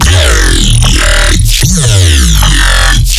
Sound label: BASS SHOT VOCO GROWL D#